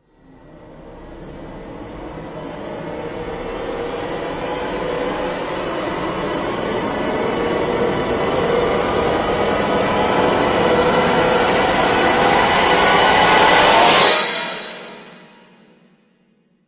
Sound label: Rise Wind Of Fury; Brand X Music; Brand X Music Catalogue - Editor's Essentials